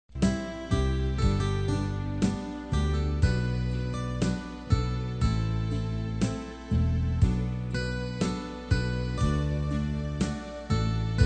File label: BASS